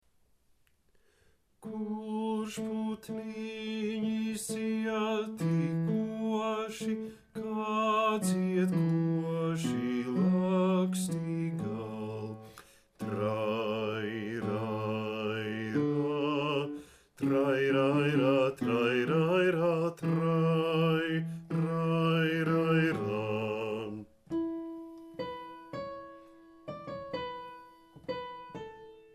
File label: Bass; dziedatajiem